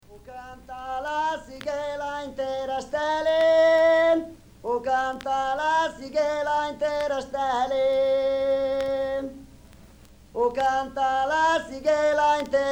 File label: song for the oxen (canto alla boara)